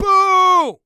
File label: Human Male Yells And Cheers Boo